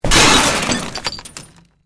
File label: Explosion Short Metal Glass; house, building or vehicle effects; Bombs; Created explosion effects